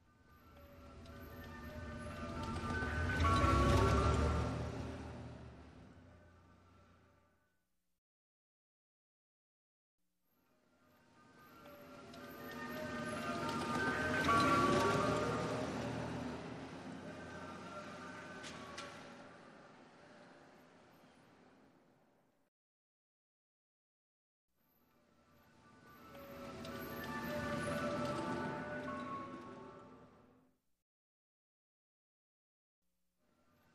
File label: Ice Cream Truck Bys